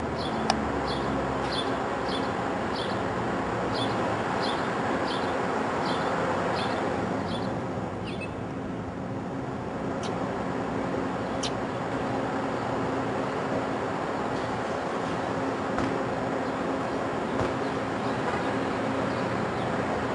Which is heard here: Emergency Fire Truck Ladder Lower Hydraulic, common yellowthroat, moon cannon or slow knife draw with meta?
common yellowthroat